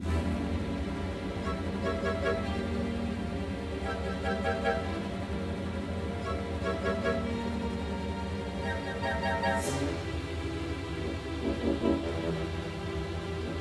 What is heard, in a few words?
Ghost; tintin reporter cigars of the pharaoh